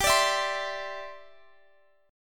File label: G 6th Suspended 4th G; strummed